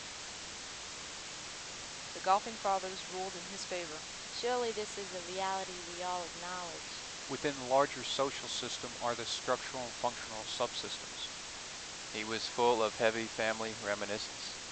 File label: noisy white; Noise Power Spectral Density Estimation